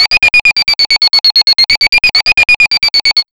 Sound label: FX, Big Beat Drumloops; USB Soundscan vol.25; AKAI